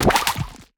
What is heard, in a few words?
Background Otter attack